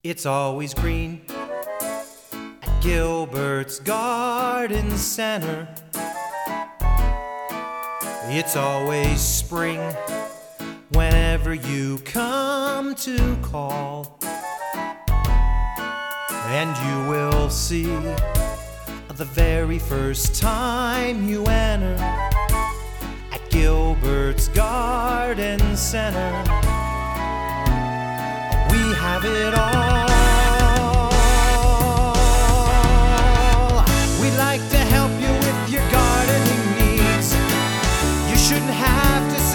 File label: Jingles; Garden Center